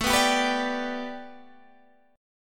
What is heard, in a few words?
A 7th Suspended 2nd Suspended 4th Guitar Standard; A7sus2sus4 strummed